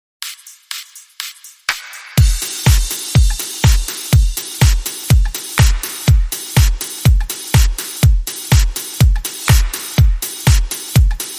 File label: Outtro Beat